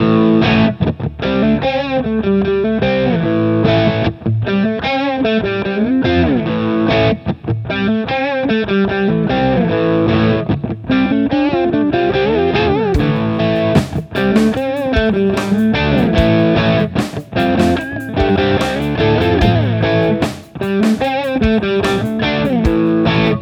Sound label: Pro Mod Crunch Both; Charvel Joe Duplantier